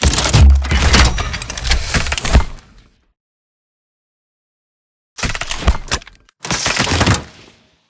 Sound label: grab arc tool away all layers; Titanfall 2